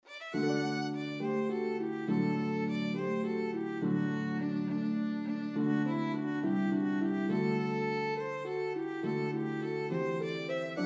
Harp and Melody Instrument in C Violin; Banish Misfortune

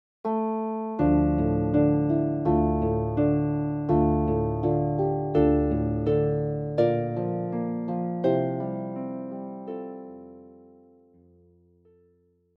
Harp; Famous Music for the Harp Vol 6

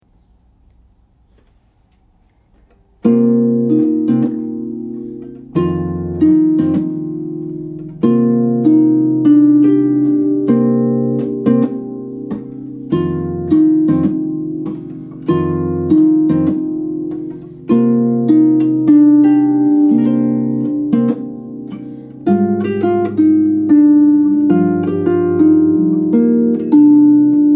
Blues, harp

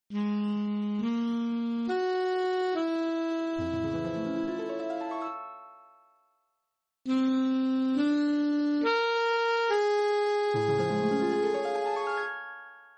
Alto Saxophone Harp Strings; Movement 2

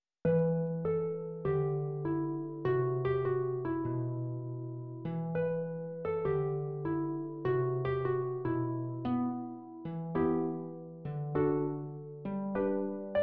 Carols for Solo Harp

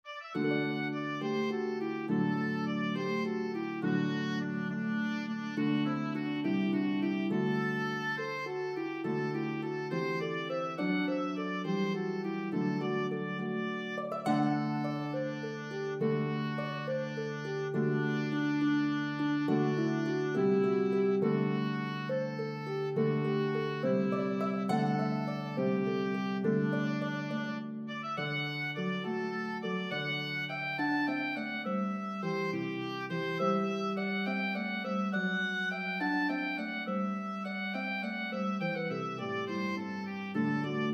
Harp and Melody Instrument in C Oboe; Banish Misfortune

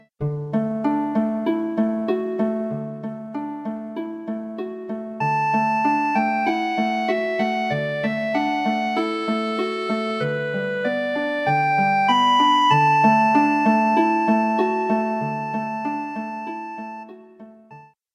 Running Waters Clarinet

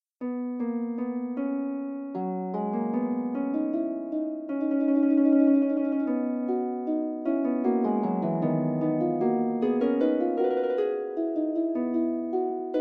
Fugue, Harp

